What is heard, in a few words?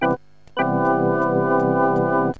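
major 7th, synth chime; cubase; CUBASIS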